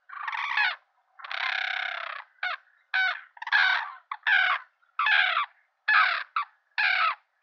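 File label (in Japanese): manazuru song, white-naped crane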